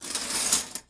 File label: Silverware Pulled from Drawer, Kitchen; 1001 Sound Effects